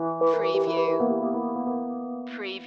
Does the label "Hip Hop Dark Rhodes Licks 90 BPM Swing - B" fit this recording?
Yes